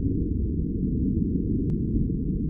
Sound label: HVAC